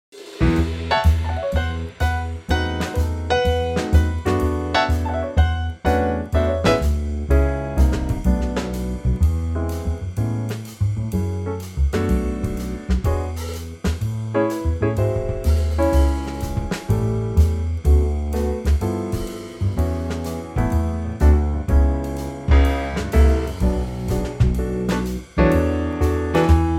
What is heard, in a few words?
A Trio